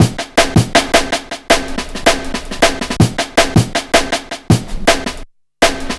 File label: 160 pimenta, Drum Loops; Drum & Bass; Total Drum&Bass